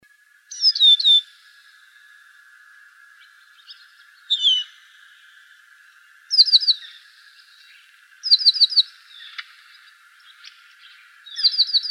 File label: CALLS, Finches; AZFO; deviche